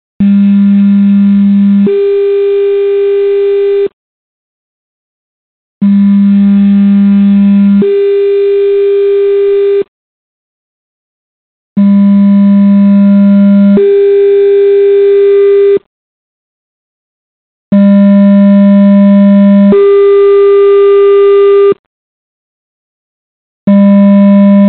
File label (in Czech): požární poplach elektronická